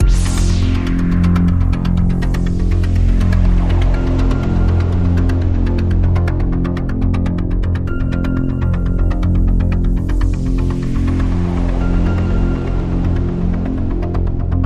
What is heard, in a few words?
Radio Toolkit Pad